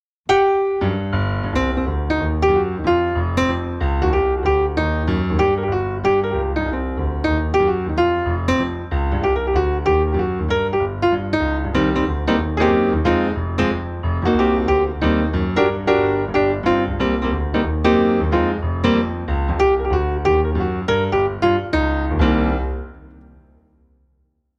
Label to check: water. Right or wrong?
Wrong